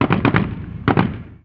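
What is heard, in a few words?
gun us assault medium; ModernWarfare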